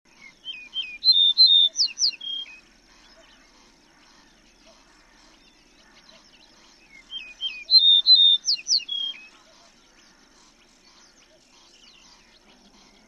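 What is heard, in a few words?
yellow-breasted bunting voice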